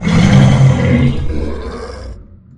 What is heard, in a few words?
xen bloater pain; infestation; Half Life Alyx